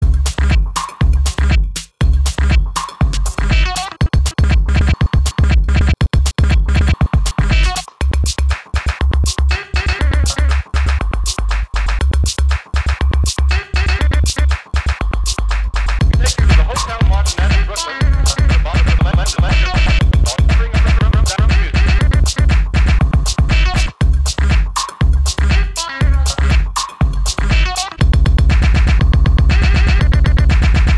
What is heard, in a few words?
Beat Breaker